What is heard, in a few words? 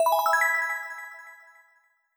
accent synth bell e major poly